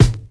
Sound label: 00s natural kickdrum a key; kick drum samples